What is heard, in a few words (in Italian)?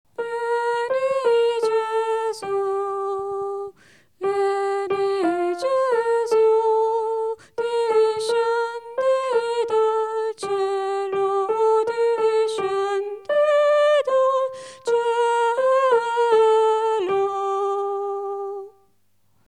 TENORI; Innalzate nei Cieli